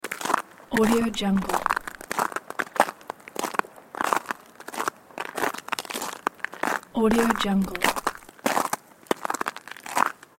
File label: walking on ice